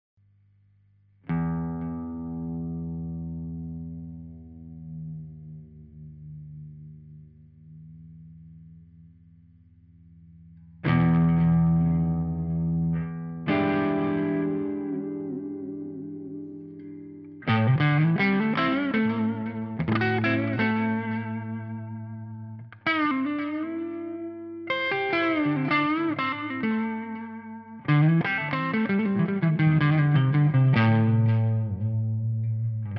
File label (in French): clean